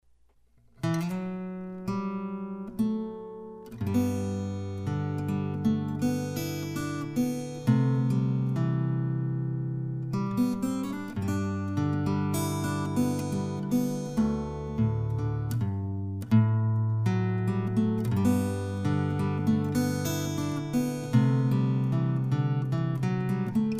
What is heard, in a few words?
guitar